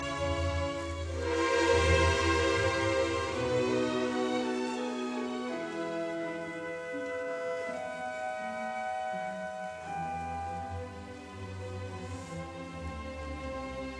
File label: Symphony in B minor, Andante con moto; Symphony No 8; Wilhelm Furtwangler